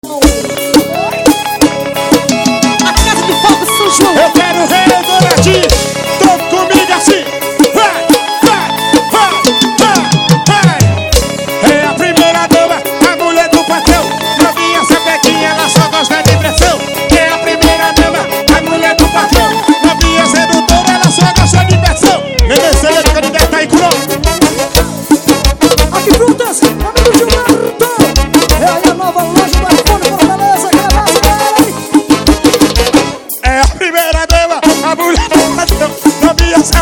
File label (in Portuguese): forro